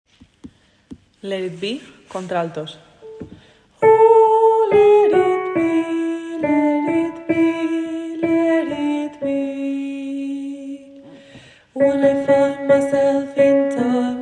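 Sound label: alto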